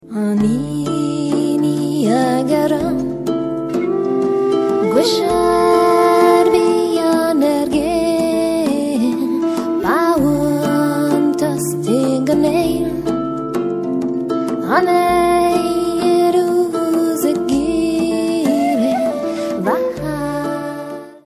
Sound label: whistle djembe